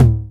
909 Tom Mid; Proteus 2000; Digital Sound Factory Proteus 2000

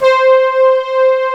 PAD, SYN PAD; AKAI S6000 CD-ROM - Volume 1; SAMPLE WAVE MIXING 1

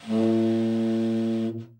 TEN SFT, TEN SAX SOFT; Giga Samples Collection

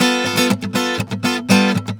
GUITAR SALSA

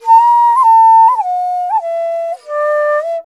INDIA ALTO

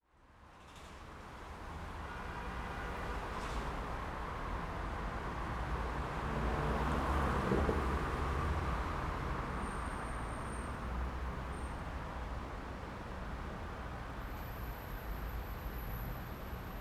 Ambience, Traffic, Car; M8

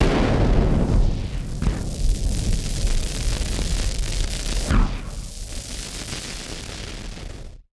Party Popper FX

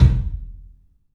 KIK, LIVE CLUB KK, Drums; 3D Sound

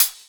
Hhat, Hihat; Equipped Music; Loungin House

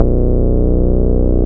BAS MINI, MOOG; Sound & Vision - Gigapack II CD 1

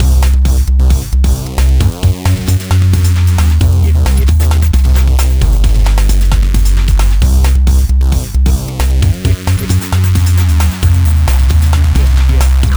Roller, 133 Cmin, Hardcore Breakbeat; Big Fish Audio; Hardcore Breakbeat Action

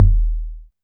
tom lo, Alesis DM5

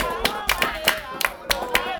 CHANT, 120

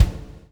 PULL, Backbeat; Spectrasonics - Backbeat

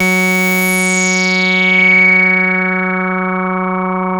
MOOG, BASSES

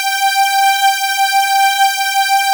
MKS RAW SAW, LEAD; S-LEAD; AKAI S-Series CD-ROM Sound Library VOL-8

